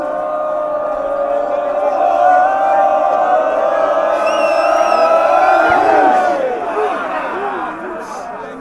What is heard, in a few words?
goal kick, fanchants; ncafc